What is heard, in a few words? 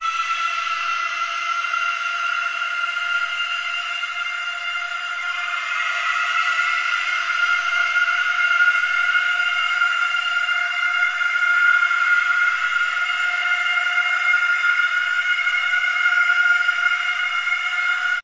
drawn out reverb scream, male; MALE MISC and SPOKEN WORD